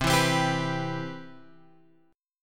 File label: C Major 7th, Guitar Open G